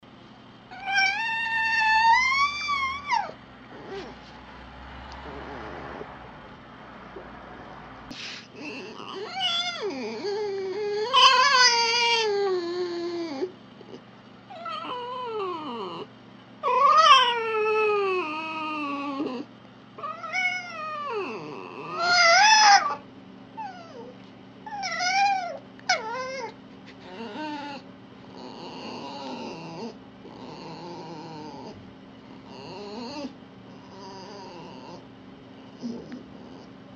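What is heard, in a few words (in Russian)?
zvuki izdavaemye lisoi, sounds made by a fox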